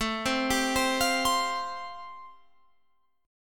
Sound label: A-Minor 7th Sharp 5th, Guitar Open F, chord; Am7#5 arpeggiated; JGuitar